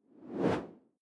whoosh simple steady

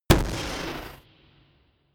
gun pop gun shot; schusswaffen

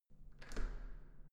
entrance door handle down soft

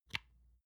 lotion large lid close soft

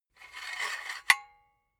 silicone gun empty pusher pull out slow; soundpool

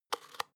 spice jar adjust fast

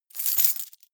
key ring large rattle air hard

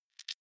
click noise, signale

